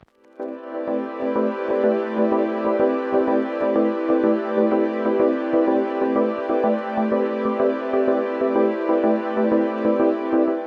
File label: SYNTH 90 A; old rec